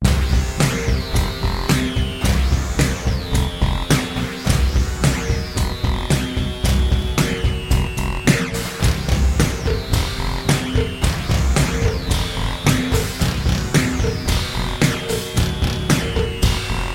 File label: Electro Rock Shock, drum